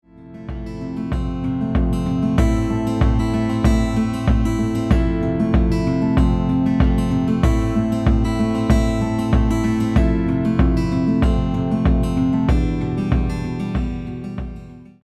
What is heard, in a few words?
folk